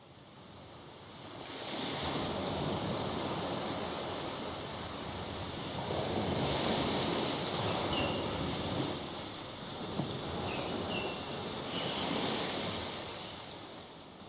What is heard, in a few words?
ocean ambient